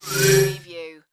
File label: Scanner Beam Fast; sound effects bible